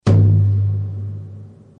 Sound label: Echo Card